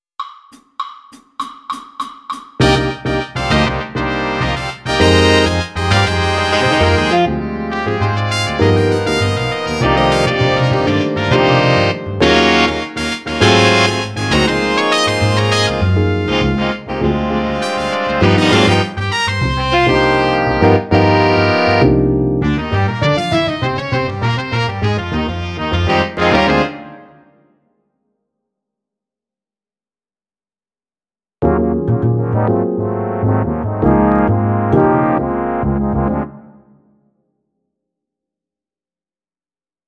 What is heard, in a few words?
Aux Percussion Samba Play a Long, Jazz; All-State